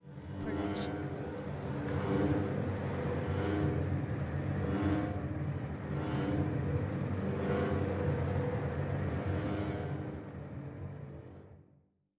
Eerie Strings Tremolo Effects; SoundsCrate

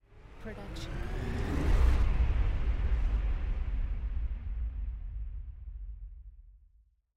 Noise Lifter; soundscrate

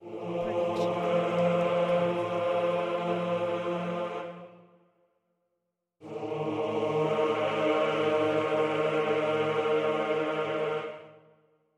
epic choir 9 men short; soundscrate

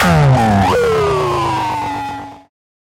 Bass Drop; SoundsCrate

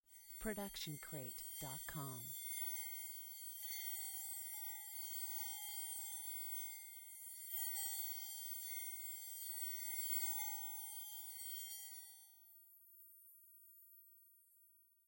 Magical Portal Shimmering; SoundsCrate

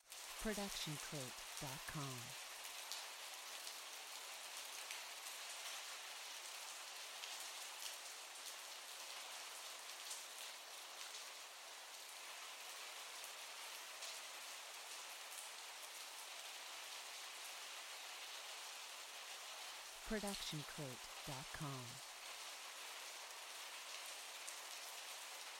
rain; soundscrate

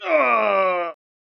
man injured groan long; soundscrate

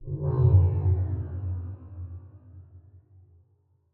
alien ship fly by

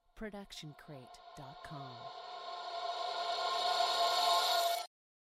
Reverse Magic Shimmer; SoundsCrate

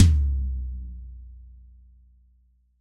tom floor; soundscrate

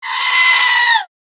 horror scream; soundscrate

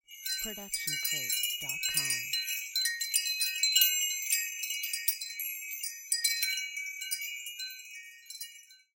Wind Chimes B

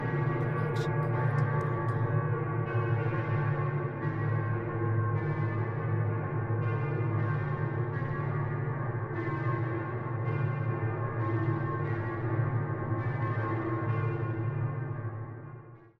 wedding bells; soundscrate

